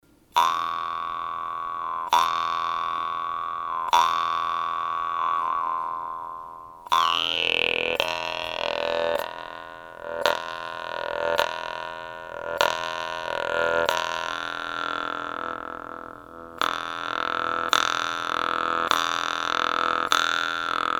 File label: g1 49.00 hz, harp